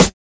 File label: Snares